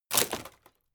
wood break

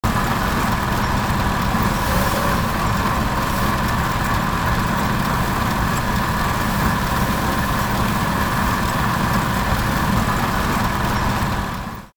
steam threshing machine

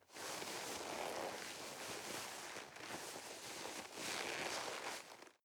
nylon leather soft long

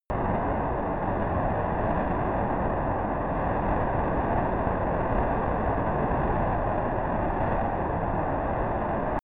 military jet fulcrum turbine steady distant

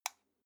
light switch on soft

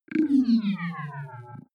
wobble drop long

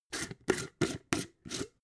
jar glass plastic open

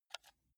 console controller arrow key press

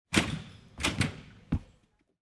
girl over jump

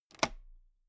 toolbox latch open loose

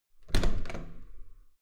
metal door heavy handle use hard

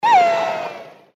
killer whale

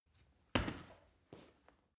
punching bag kick soft

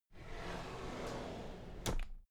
drawer cabinet equipment open soft

